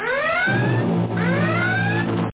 attack; amiga

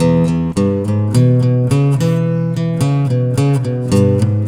Acoustic Guitars; ACID MUSIC; Poe Dunk

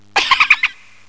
chipmunk

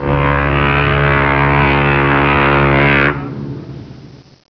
boat air horn; Atmosphere Lite

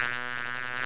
BEE

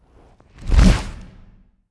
wings; Earth and Beyond; EA Games

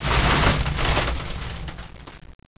drift

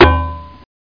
ornament bonk; HOLIDAY; decorate

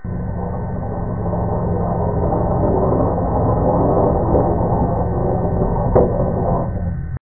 door shut; drwho

